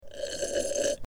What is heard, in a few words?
Slurping soda